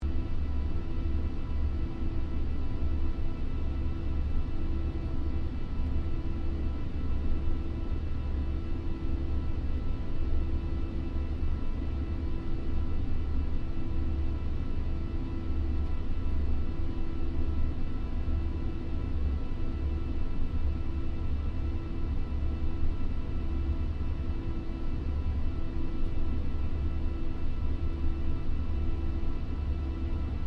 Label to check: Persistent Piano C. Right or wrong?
Wrong